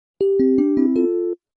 notification

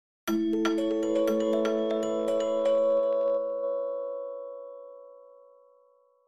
calm ringtone

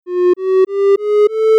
ascending tones

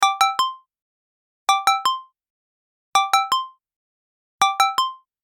bright phone ringing

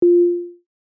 short punchy sine wave ding, F

